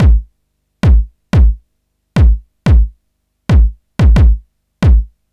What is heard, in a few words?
Kick Loop, Drum & Bass; Construction Kit